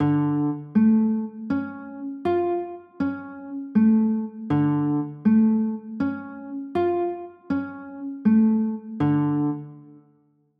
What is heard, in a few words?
d m maj7, chords